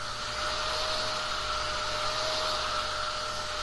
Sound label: Hair Dryer